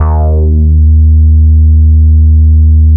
FUZZ D#1; Strictly Synthe1; E-MU Producer Series Vol. 6 – Analogia Project

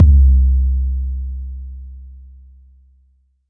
Jungle Kick; Roland SH-32; Drumset 01

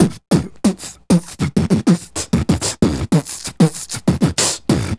Beat Box, Hip-Hop and RnB Vocals

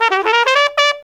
TRUMPET, BRASS

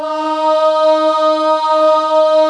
VOCAL AH EB3; B CHOIR

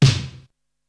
Tom, Drums; ZERO G - Datafile DISC 2

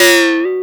SYN, Drum & Bass, 160 BPM

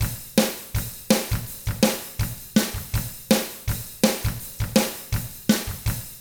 ROCK, Double Platinum Drums

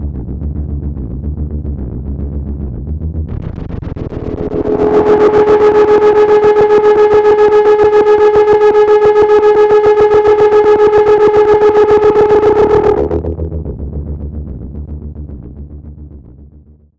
amb, Ambi Drones, Chillout; Best Service ProSamples vol.36 - Chillout

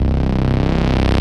C Bass, DnB One Shots

